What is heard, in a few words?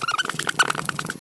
dancing dragon die